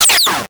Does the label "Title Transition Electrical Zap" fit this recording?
Yes